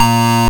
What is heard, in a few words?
YM Synth A#; SYNTHS; CHIPSHOP DELUXE; CHIPSHOP HITS